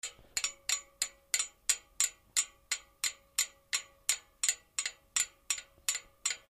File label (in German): metallisches klopfen